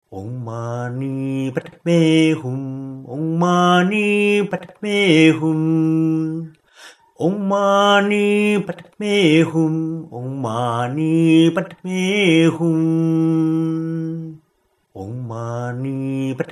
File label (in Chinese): 梵音六字大明咒唱頌; 四臂觀音; 又稱觀世音菩薩心咒